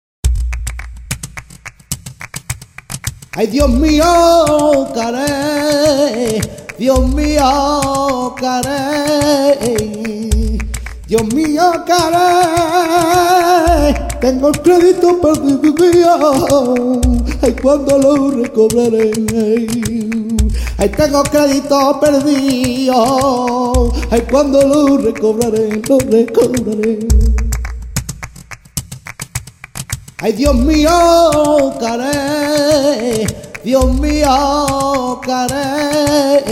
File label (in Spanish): bulerias jerez